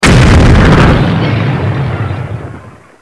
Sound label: Loud Explosion; UltimateShooter